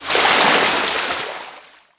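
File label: water splash; Macworld (1999-02); Games World; DroidWorks demo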